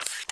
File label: claymore; The Sum of All Fears